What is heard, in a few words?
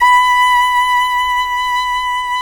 FIDDLE NOTES, B5, L